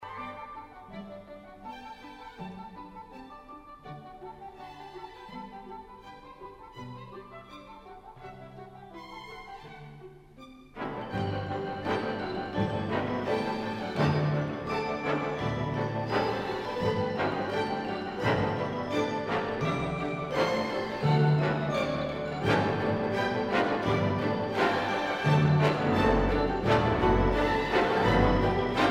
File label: marimba; orchestral; magic marimba